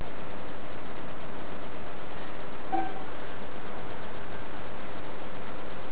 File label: piano note